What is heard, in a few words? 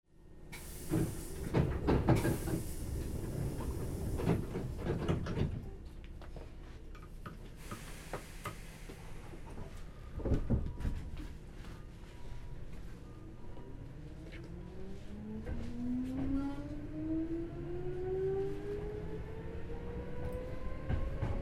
Hankyu 7000